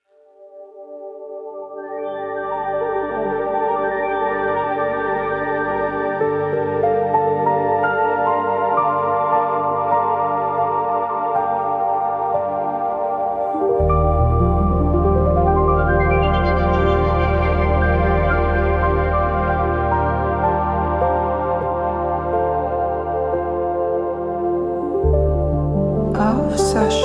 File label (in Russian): Музыкальная Церемония Очищение